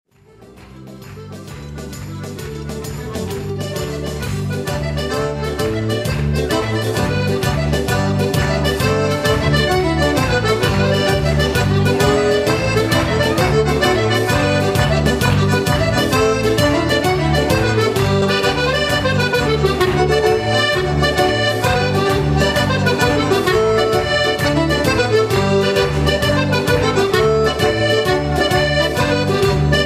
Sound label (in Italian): Organetto abruzzese